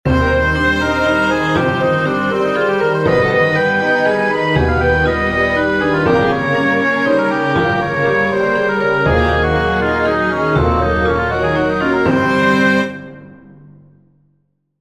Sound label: chords